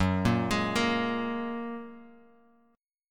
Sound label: Gb Major Add 9th, Guitar Open F; arpeggiated; 6,5,8,5,x,x; JGuitar